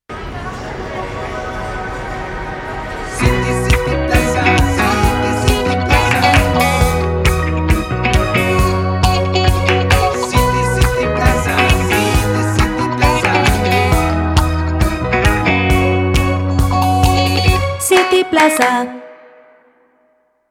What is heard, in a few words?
brandsong; cityplaza